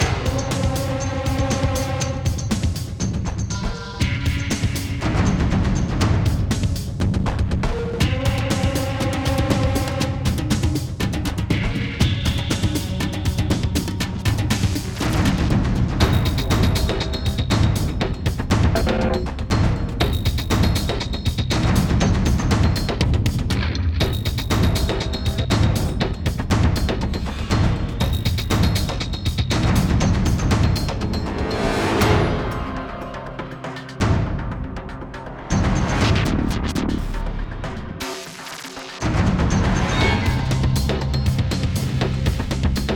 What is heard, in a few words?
Stronghold Combat; Bloodshot